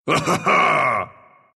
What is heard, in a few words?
laugh, ru; dota2